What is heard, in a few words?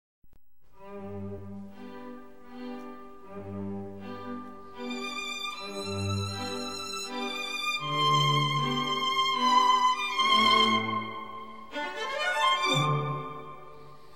Female Variation SLOW; Satanella